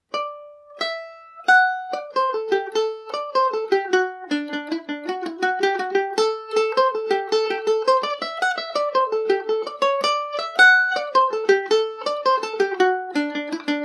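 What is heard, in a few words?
D mixolydian, Irish mandolin; banish misfortune revisited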